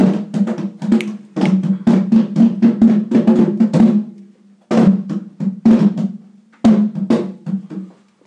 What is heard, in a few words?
drumming compositions